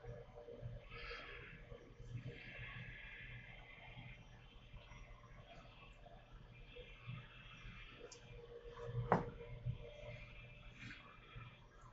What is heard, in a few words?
Basement Open Space EVP Enhanced; caliente pizza draft house crafton investigation